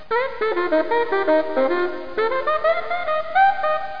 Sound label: muffled sax; How Many Bugs in a Box